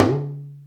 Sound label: yalu, African percussion; AKAI S6000 CD-ROM - Volume 1